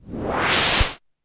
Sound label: Short Ms20 Noise Sweep